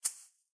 Keypress Delete; Asus; Eee Pad Transformer (TF101)

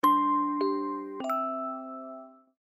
Music Box, notifications; Vivo X27 Pro

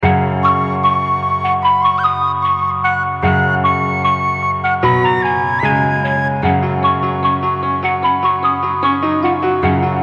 Jumping on; Alcatel T600M Telenor; switch on off

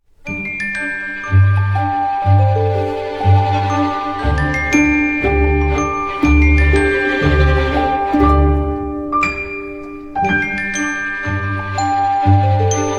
Dewdrop, Ringtones; Nokia 208; Southeast Asia

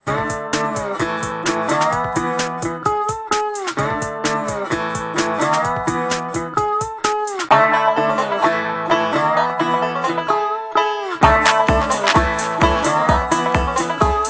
Banjo Remix; Nokia 5200

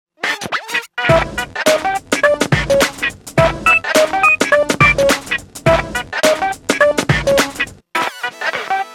Nokia 7380 Ringtones

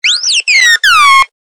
Impatient Whine; Motorola; ringtones; Droid 2 R2 D2

